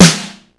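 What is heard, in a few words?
AMBIENT SNARE; Hollywood Edge; The Big Bad Beatmachine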